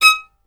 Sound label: STR VLN, Solo Instruments; Miroslav Vitous